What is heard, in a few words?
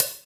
Hhat, HiHat; Equipped Music; Loungin House